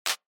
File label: Short Accent Single